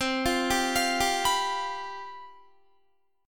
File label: C Major 7th Suspended 4th, down, Guitar; arpeggiated